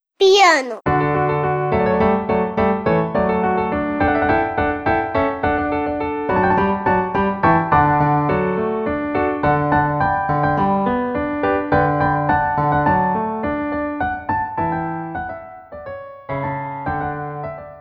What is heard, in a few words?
PIANO